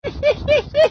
risada

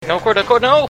corda no

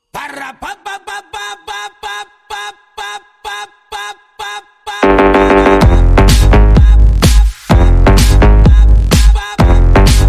mega funk; morro do dende

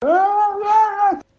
grito da harpia

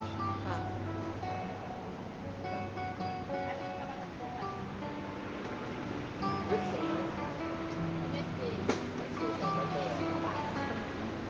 violao

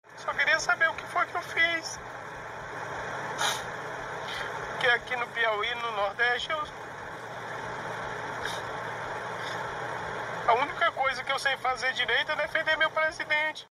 chorando

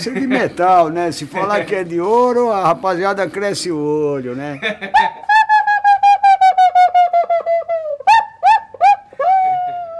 a cuica tem voz nas maos

